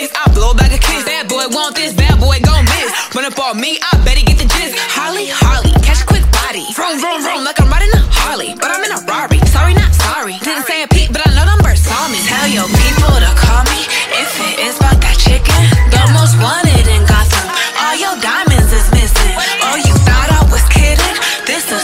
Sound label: english ringtone; english ringtones